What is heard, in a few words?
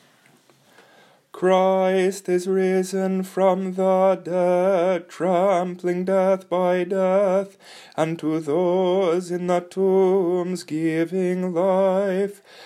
Christ is Risen Kyivan Chant